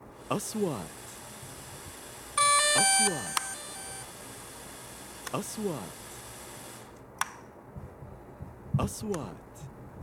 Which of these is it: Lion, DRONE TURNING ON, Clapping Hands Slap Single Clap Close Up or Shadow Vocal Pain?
DRONE TURNING ON